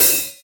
crash cymbal one shot e key, Royality free crash cymbal one shot tuned to the E note.; drum sample